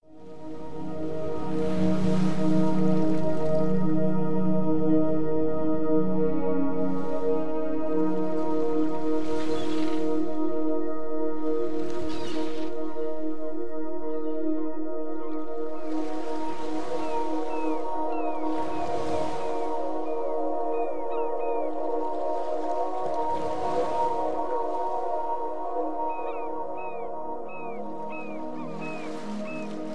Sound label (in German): Traum Meditation MUSIK